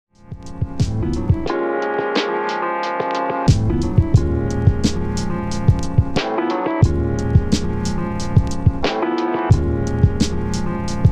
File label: Beat